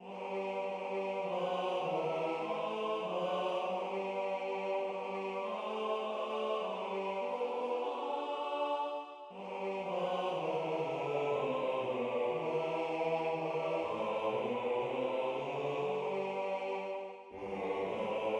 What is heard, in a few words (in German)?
Bass